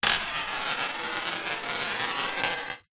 money coin roll on glass; foley; zapsplat

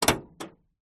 car trunk open volvo 850

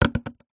foley toilet roll empty side set down table; zapsplat

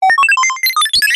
science fiction robot small cute vocal beeps

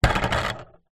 table soccer game bar spin

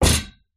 household chair metal legs set down wooden floor

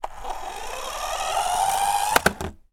toy car radio controlled drive bash into object

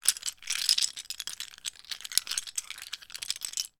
toy lego blocks movement in hand; sound effects one

